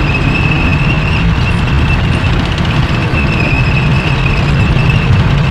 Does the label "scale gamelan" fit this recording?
No